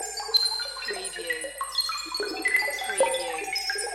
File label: Space Scifi Sound - critters few